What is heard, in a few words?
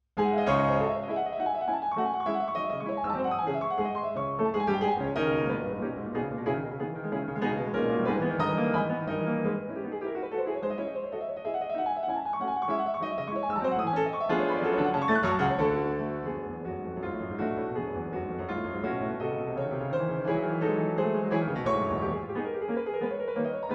Etude in C-sharp Minor, pianoteq; Chopin; Op. 10 No. 4